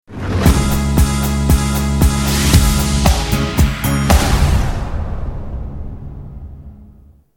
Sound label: bed